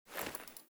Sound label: Foley Walk Default, Foley Default Clothing; Characters; Player